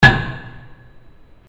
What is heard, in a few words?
metal hit